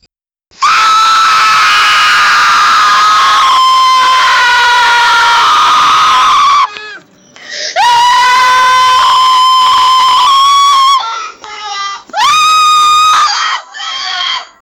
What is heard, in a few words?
screaming